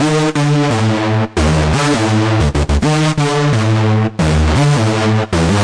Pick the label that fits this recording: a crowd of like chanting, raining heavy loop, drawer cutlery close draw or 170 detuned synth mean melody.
170 detuned synth mean melody